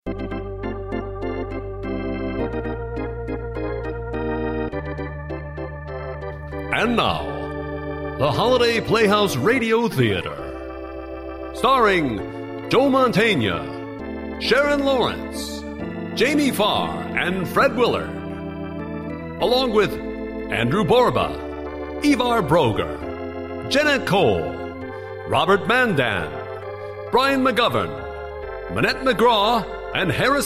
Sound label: Radio organ and intro; Tony Palermo; Wonderful Life